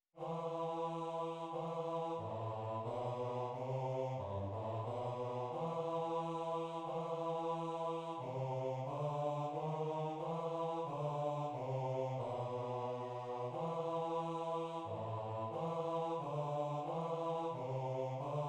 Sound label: bass; zborove skladby